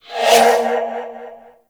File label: Spirit Primary Fire Whizzby